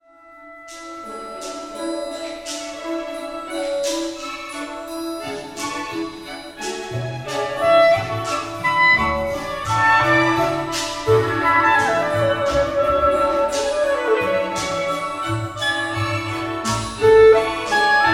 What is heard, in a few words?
clarinet concerto